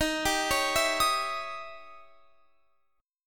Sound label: D sharp 7th Flat 9th Guitar Open F; D#7b9; arpeggiated